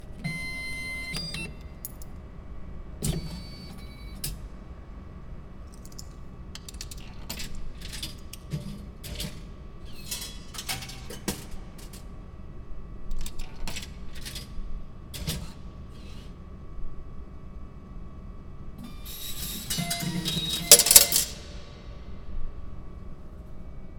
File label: car garage ticket automate